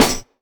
sizzly steel snare drum sample b key

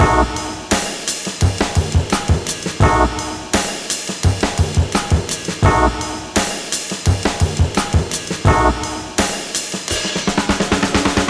85 bpm drum beat d sharp key; Samplerate 44100 Hz; Channels Stereo

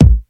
raw bass drum one shot a key

